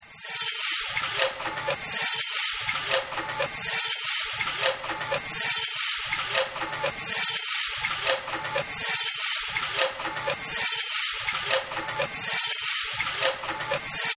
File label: Factory